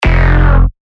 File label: bass shot F; Atone; revealed bass shots vol 3